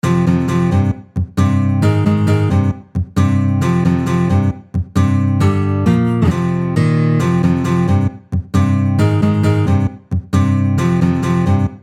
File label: riff